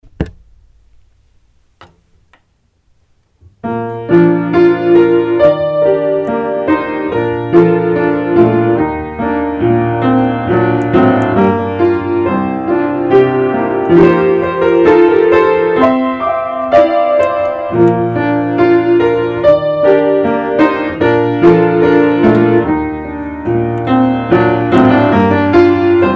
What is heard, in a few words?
piano